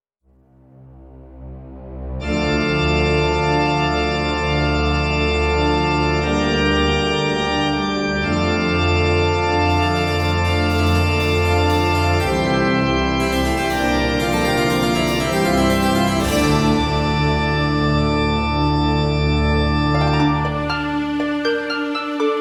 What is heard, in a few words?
Bagpipe